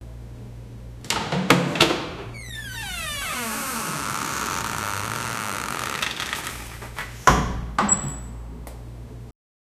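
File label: a door being opened leading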